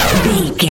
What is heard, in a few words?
retro spaceship jet boost